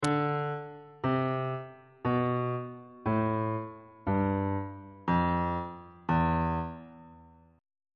phrygian mode descending on bass clef

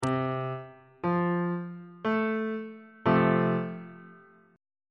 e sharp major 2nd inversion on bass clef

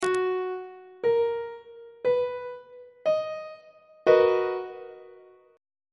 b major 7th 2nd inversion on treble clef

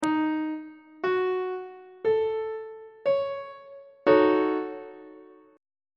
g flat minor 6th 3rd inversion; on treble clef

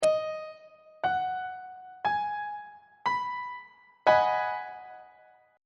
a flat minor 7th 2nd inversion on treble clef

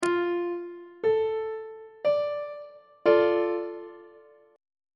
c double sharp minor 1st inversion on treble clef

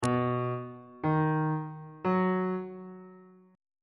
f sharp ionian chord 4 is b major on bass clef

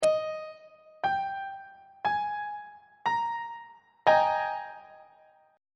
a flat major 7th suspended 2nd 2nd inversion; on treble clef

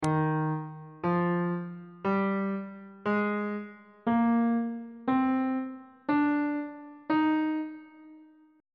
d sharp major scale on bass clef

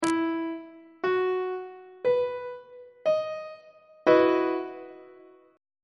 f flat major 7th suspended 2nd chord; on treble clef

